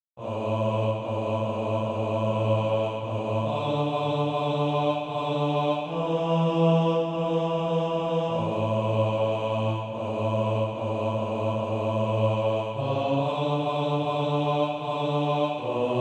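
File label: bass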